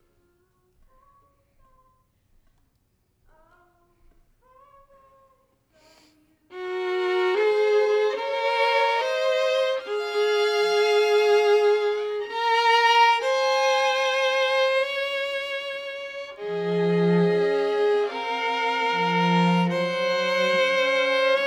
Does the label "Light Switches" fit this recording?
No